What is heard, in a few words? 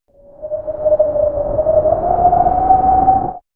epic high altitude wind; atmospheric